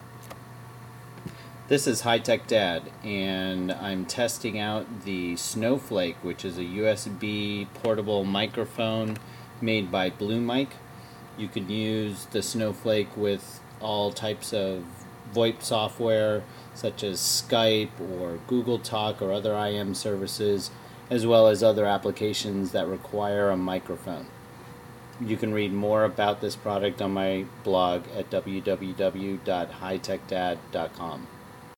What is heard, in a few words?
Built in Microphone recording